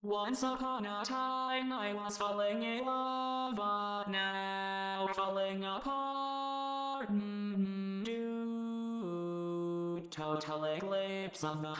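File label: Baritone, barbershop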